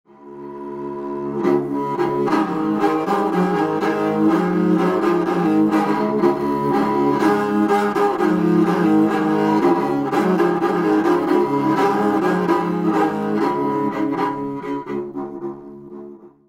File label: Jouhikko